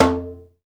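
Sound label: conga low, AFRICAN PERC; Roland - LA Composer vol. 1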